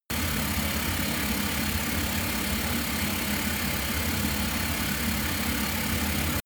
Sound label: spinning rattle, sciene fiction